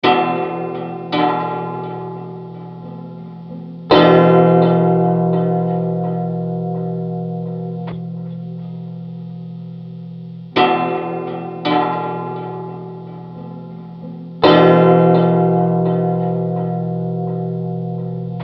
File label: Plucked Guitar Explore Mood; gamerip; the last of us